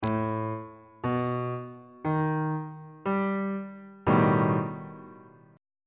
c flat augmented 7th 3rd inversion

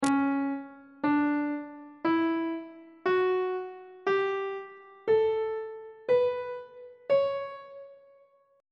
d flat locrian mode on treble clef

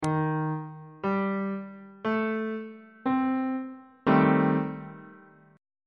a half diminished 7th 2nd inversion on bass clef

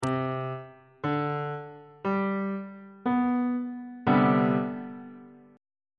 c major 7th suspended 2nd chord on bass clef

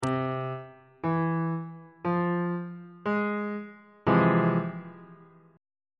f minor major 7th 2nd inversion

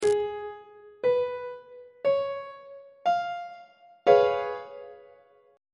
d flat dominant 7th 2nd inversion on treble clef

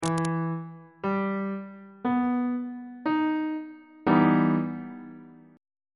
d double sharp minor major 7th chord

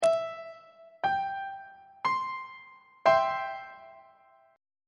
b sharp major 1st inversion on treble clef

